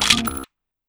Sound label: ar2 reload rotate, weapons